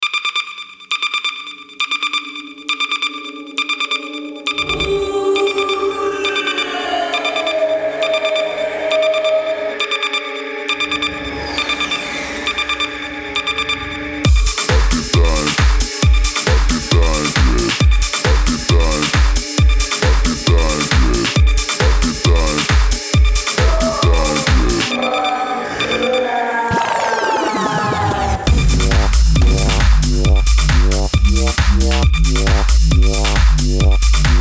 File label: garage